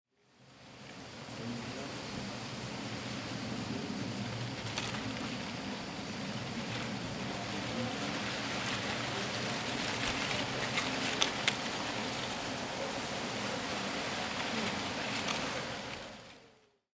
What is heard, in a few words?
Wind And Leaves